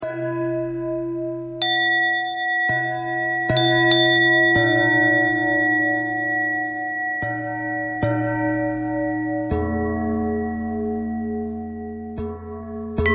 bell bell thread noise

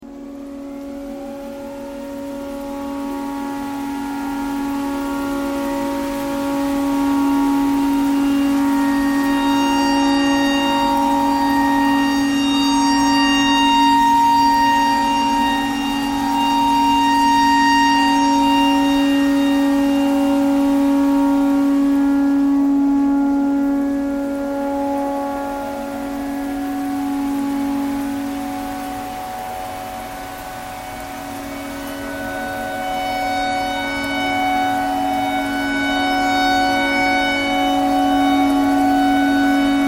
tornado siren tornado siren thread pentatonic

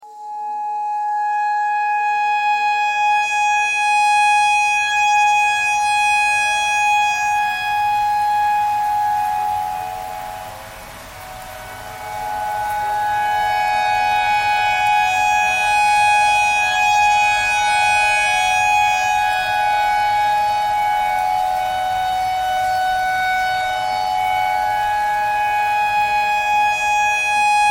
tornado siren clarinet notes e thread simple

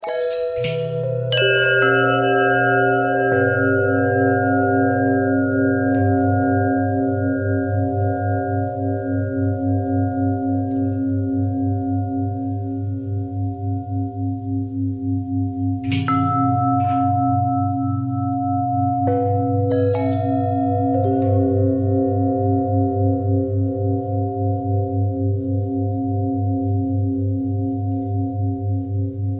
bell long bell thread simple

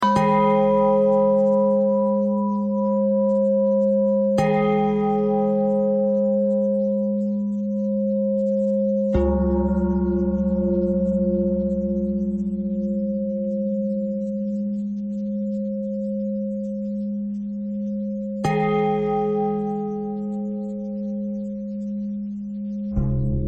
bell thread pentatonic

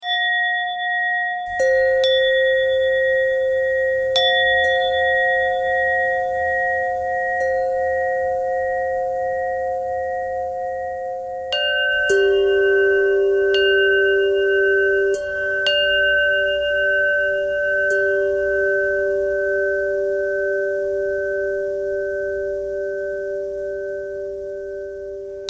bell thread bend thread

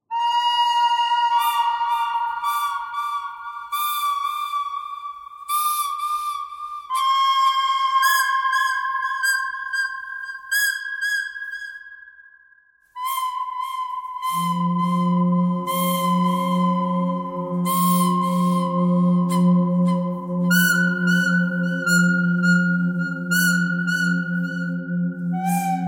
bird canyon bird thread simple; birds

